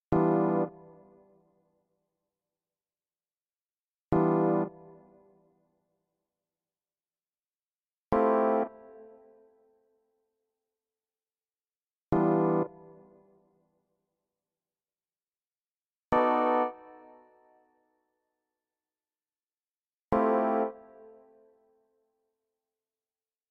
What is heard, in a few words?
Em 120 funky groove